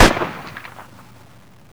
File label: 30cal; WAR